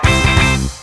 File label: door